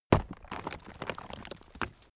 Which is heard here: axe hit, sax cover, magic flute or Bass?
axe hit